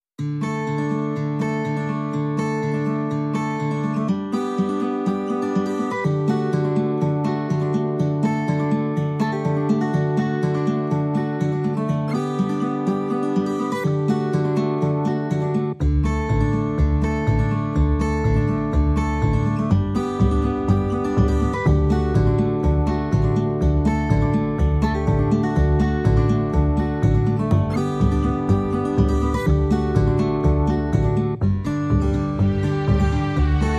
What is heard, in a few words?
No Drums Reduced Arrangement